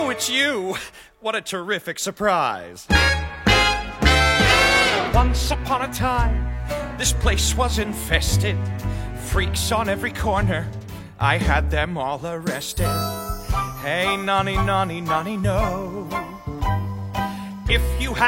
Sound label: Vocals; Lord Farquaad; Duloc; academy players audition materials